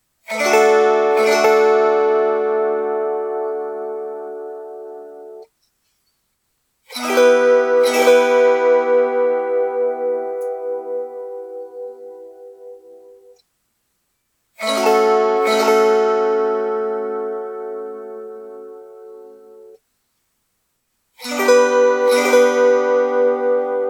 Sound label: kantele 6 codes